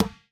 Physics Object Gym Ball Impact

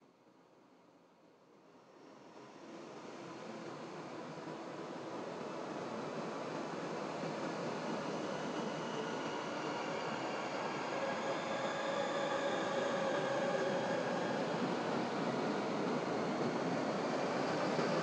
jigoku Ambience; AmbienceYamiya

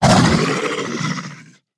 Monster Attack Finisher

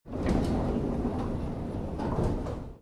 Field Object Elevator Down; starbites

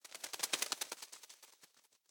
Pigeon Fly Away

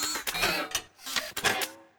Mantis Onde Moove

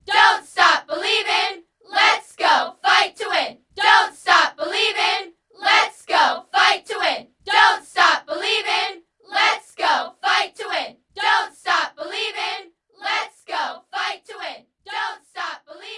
Sfx Basketball

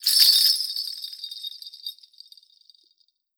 Psycho Imp Bells; Legend of Keepers (gamerip) (2019)

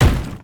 Mushroom Brawler Head Bang

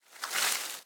Footstep Foliage Palm Rev; Viewfinder; Xbox Series X/S

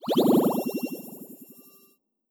Harpoon Bubble Kill

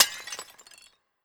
Eye Explo; Sarco Horus

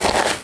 Sand footstep

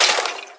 Skeleton Damage

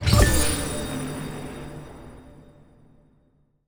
Ui Reward; Modrare; Forza Motorsport 6 Apex

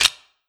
Skel Foot Step; Legend of Keepers

